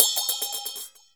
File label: CHINA, CHINACYM; Spectrasonics - Heart of Asia CD2